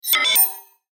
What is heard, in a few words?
Mouseover Button Electronic Digital Whoosh